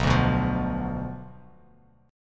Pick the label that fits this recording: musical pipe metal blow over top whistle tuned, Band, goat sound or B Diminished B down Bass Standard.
B Diminished B down Bass Standard